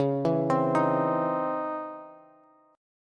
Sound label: Db Minor Major 9th Db Bass; arpeggiated; DbmM9; JGuitar